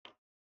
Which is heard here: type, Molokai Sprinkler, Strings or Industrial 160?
type